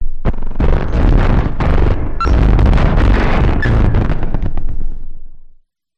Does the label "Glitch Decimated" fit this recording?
Yes